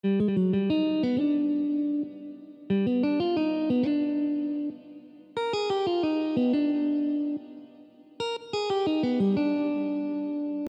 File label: minor 251